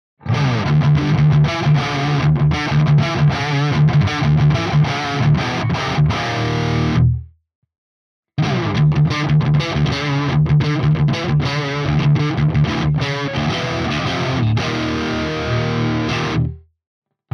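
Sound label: RGT1270 rhythm all positions Riff, guitar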